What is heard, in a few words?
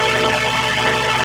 Heat Beam Loop